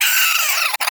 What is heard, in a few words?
Cryptilex Vocal Death, Hunting Critters; Characters; Warframe